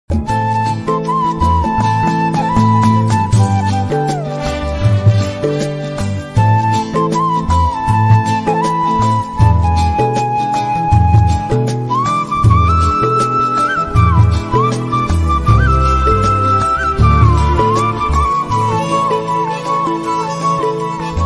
best old instrumental ringtone old is gold

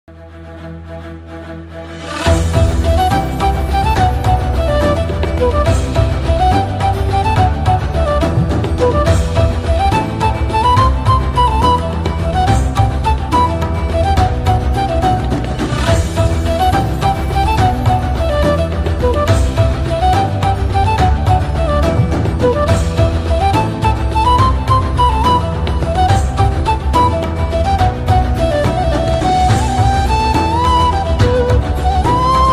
flute ringtone ringtone; pirates of the caribbean; jack sparrow

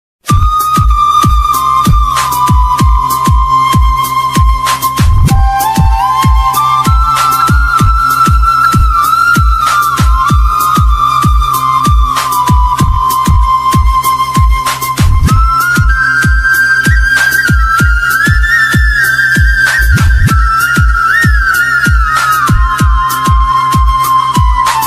flute ringtone instrumental ringtone

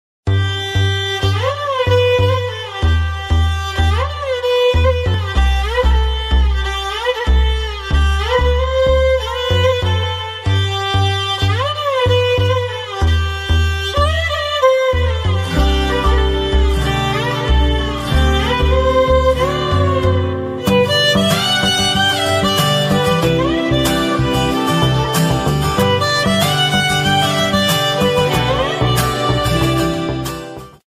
song bgm; webseries

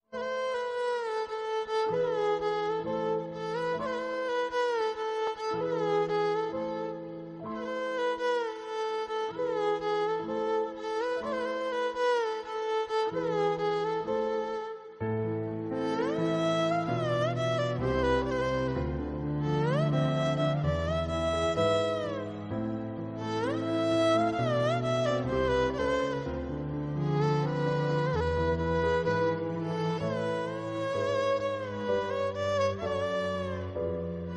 instrumental ringtone song

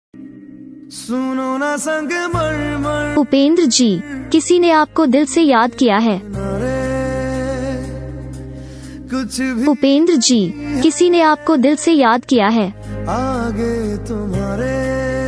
name ringtone

